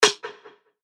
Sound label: Identity, percussion; revealed percussion vol 3